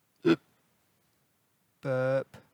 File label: burp